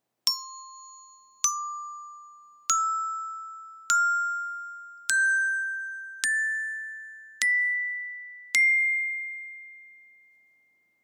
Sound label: Wooden Club